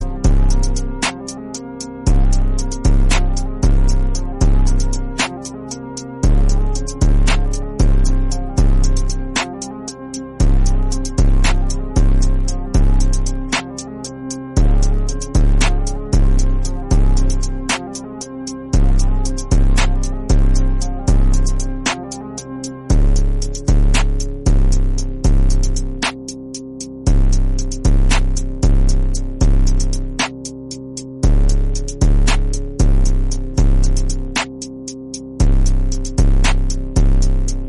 instrumental bgm

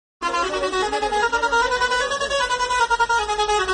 bus horn; bus simulator indonesia

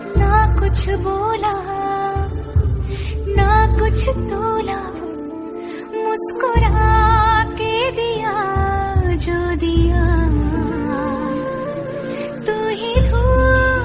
female version, hindi song

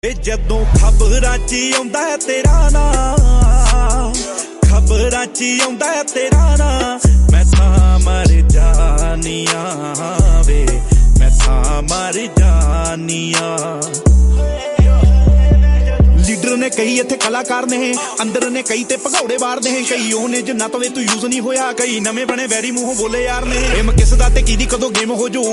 punjabi

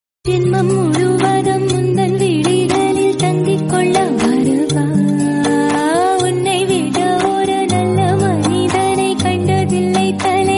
tamil

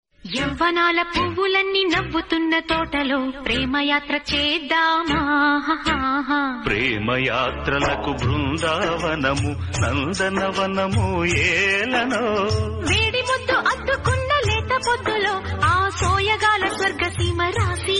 old movie songs telugu

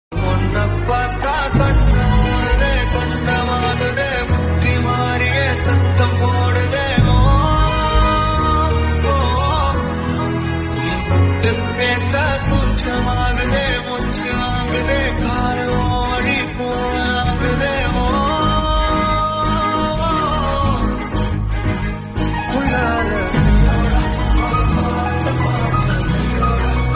remix bgm

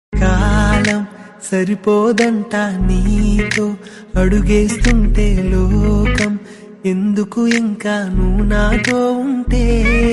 motivational song

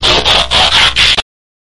transformer sound

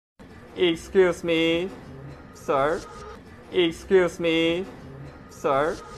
piano

